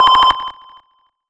Checkpoint

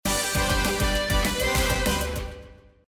Music Sample; vortex attack ex windows gamerip 2019

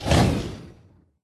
Attack Shot; Hero Beresad

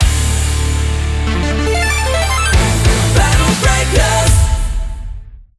Battle Intro Stinger

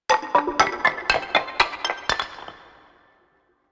Tap; Yu-Gi-Oh! Master Duel

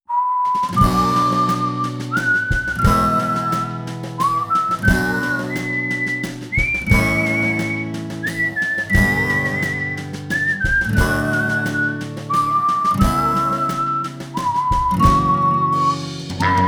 Whistle; Metal Gear Solid 4 Guns of the Patriots

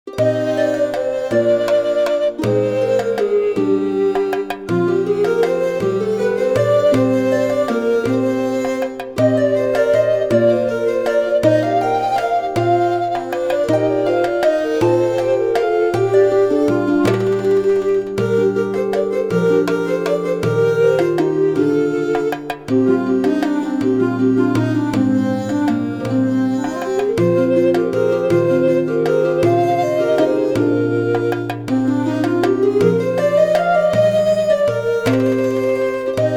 Tavern; Two Worlds 2

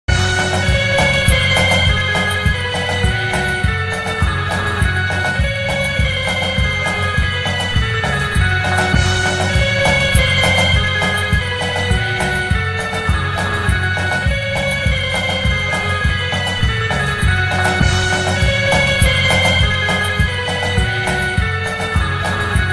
Scary Music; The SpongeBob SquarePants Movie

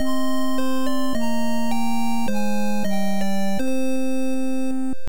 Defeat; Tenchi o Kurau II Shokatsu Koumei Den; Family Computer

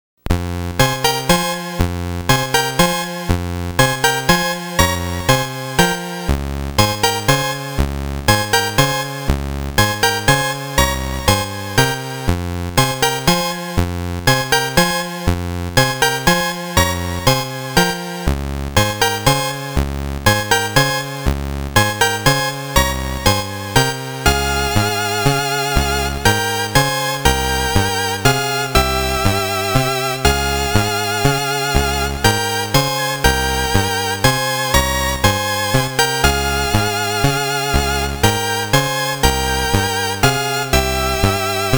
Zither; super zangyura

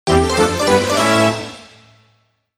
Key Item Get; paper mario the thousand year door

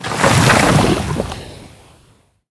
Magma eruption; Hero Oloch

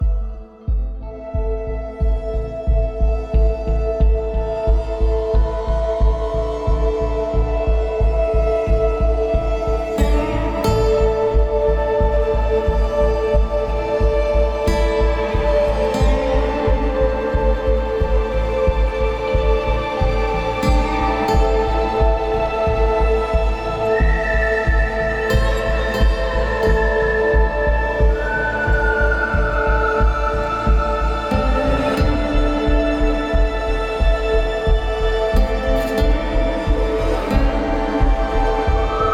Day Ambient Loop; Route99

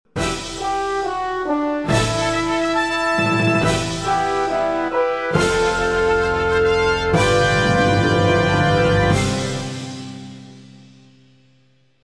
victory theme; SNK Ultra Rare Tracks

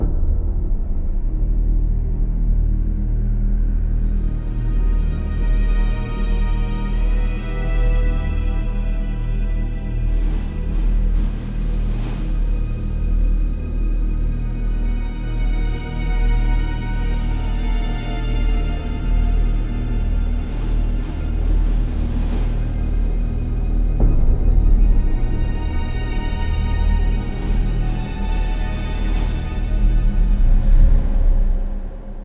Exploring Dusk Loop

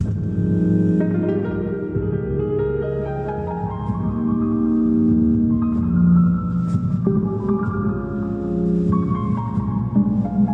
Elevator Music; Shadow Warrior 2 gamerip 2016

